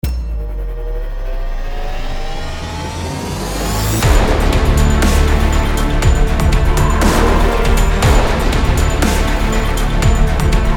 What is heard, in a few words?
Hybrid Trailer